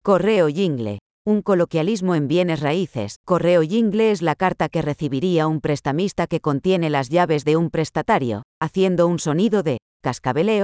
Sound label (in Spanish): jingle; Correo